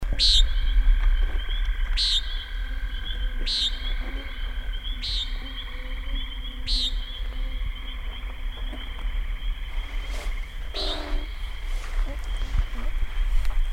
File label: Nighthawk